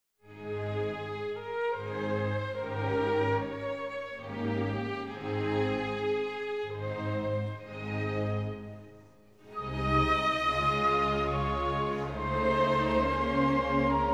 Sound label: Concerto for Trumpet and Orchestra Allegro